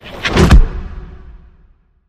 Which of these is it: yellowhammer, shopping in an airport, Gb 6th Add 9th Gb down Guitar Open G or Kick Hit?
Kick Hit